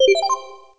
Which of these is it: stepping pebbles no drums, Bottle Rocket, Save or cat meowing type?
Save